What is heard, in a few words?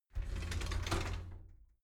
shower slide door inside open soft halfway